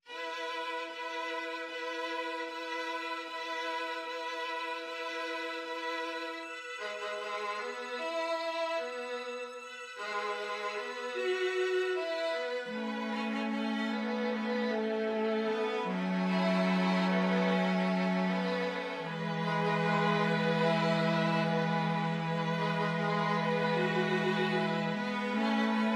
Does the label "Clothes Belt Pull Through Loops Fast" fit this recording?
No